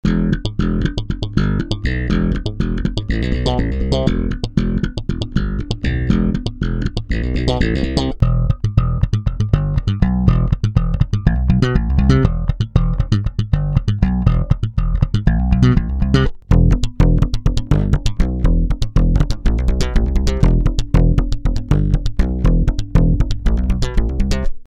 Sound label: DI Charvel neutral